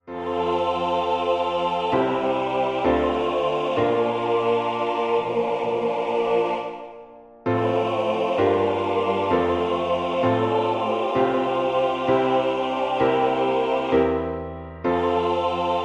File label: MIDI SATB Lead